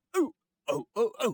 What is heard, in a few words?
Man Dance Happy; voice; Zomboid; Lifestyle